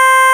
E. BASS C5; Big Fish Audio; Synth City

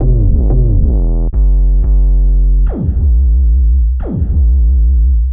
Victory Bass, Drum & Bass; Fluid Dynamics

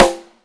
CM SNARE; AKAI S6000 CD-ROM - Ultimate

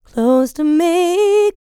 LICKS, Vocal R&B Dance; LT DANCE

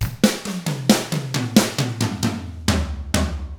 SLOW ROCK, Drums; ILIO; Double Platinum Drums 1

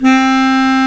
Bs Clarinet, Orchestral Winds; Lo Clarinets; Roland LCDP04

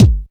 BD DIGI, Korg Electribe EMX-1

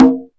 CNG, Korg Electribe EMX-1

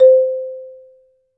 MARIMBA; ILIO

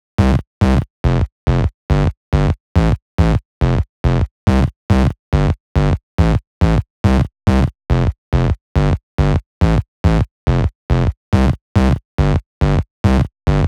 140BPM C; Vengeance - Essential Clubsounds Vol.4

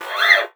FRET, FINGER MUTE; Xsample VOL-1

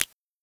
808 Sna; Roland MV8800; Dirt&GrimeKit

